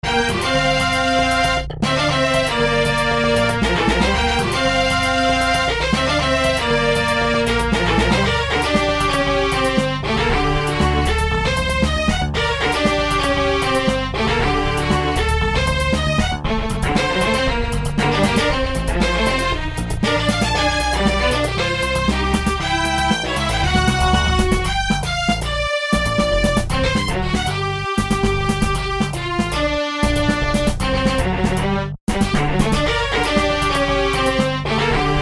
Strings; The Silence Of Daylight; Castlevania II; Syntheway

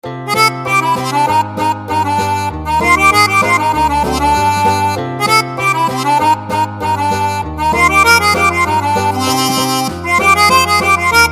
Virtual Harmonica; VST Plugin; Syntheway Akkordica

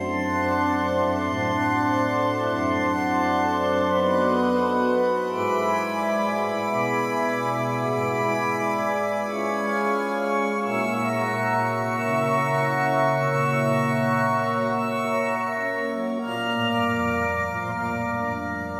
PAD Spacey Organ; Synthractive; syntheway

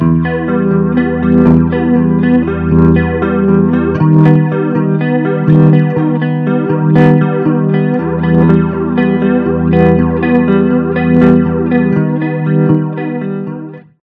Stereo Flanger Ultra Chorus Flow Guitar Wet; Sonicircuit; Syntheway

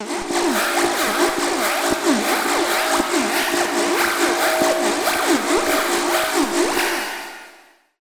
SFX Permuted Frequencies; Phasewaver; syntheway

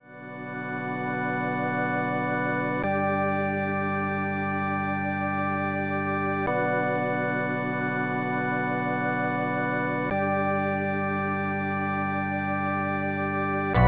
Farfisa Compact Organ; Organux; Syntheway

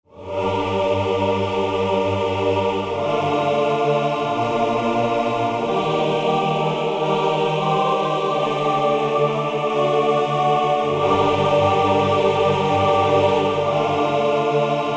Ave Maria Gratia Plena, Choir; Magnus Choir

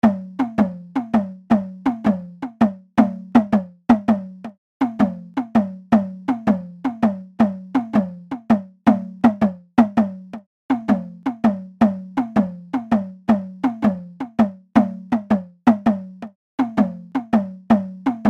Indian Percussion Naqqara Nagara; Sitarkha; Syntheway

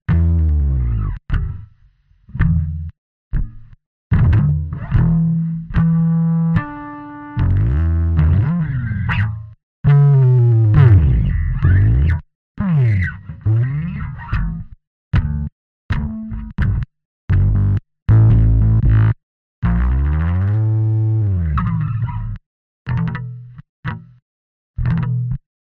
Bass Slides and Fills; Bassesland; Virtual Acoustic, Electric and Synth Bass KONTAKT Sample Library for NI KONTAKT; Syntheway

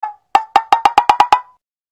drop

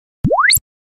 Filter Sweep

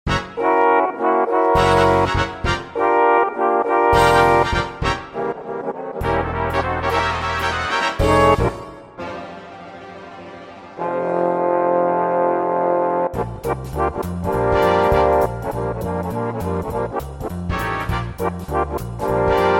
Trombone Trumpet Orch Ensemble; Aeternus Brass; Virtual Trombone; Bassesland; Syntheway

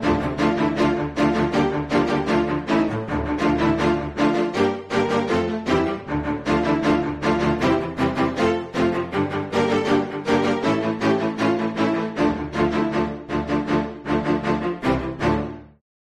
Strings Martele Bow Stroke; Synthphonica; Syntheway

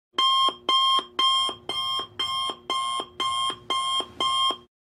alarm clock

